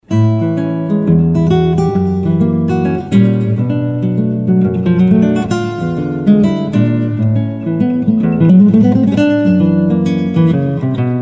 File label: Guitar